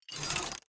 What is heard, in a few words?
environment gate up